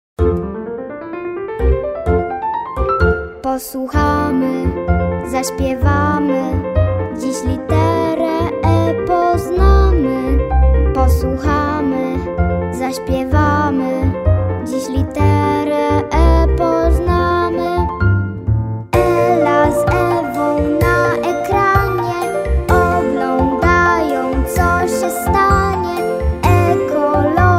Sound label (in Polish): piosenka litera e; szkola marzen na tak; klasa 1